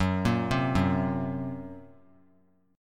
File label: Gb Major Flat 5th, down; arpeggiated; JGuitar